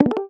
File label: enter notification